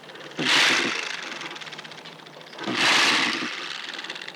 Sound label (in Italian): Verso dell istrice; punto 3 sentiero della fornace